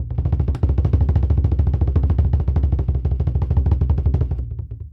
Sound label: Bass Roll, African Drums, Bass Drum Rolls; Quazibeat; African Secret